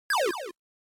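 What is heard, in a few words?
Game Boy General Pitch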